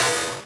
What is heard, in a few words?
MOVE; C64 Dreams; Multi-Sound Default